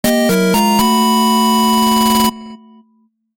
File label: Clear Fanfare (8-Bit); Sky Temple